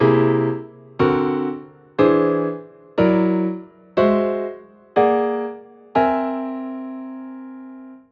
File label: Jazz Symmetrical Scales Alt chords; How To Improvise With Symmetrical Scales